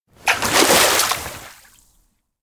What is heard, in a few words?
pullup; chiharu; ken; anemoi